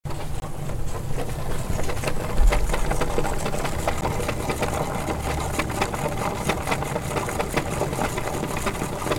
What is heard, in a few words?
Radiator Boiling